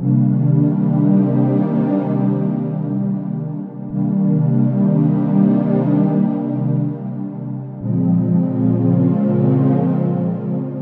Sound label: arcade pad; lucah pad a